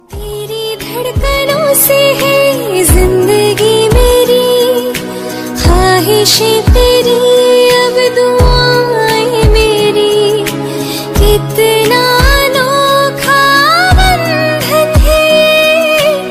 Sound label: Female Version